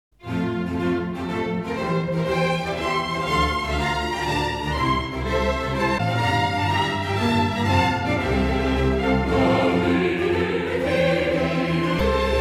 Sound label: Orchestra Vocal Ensemble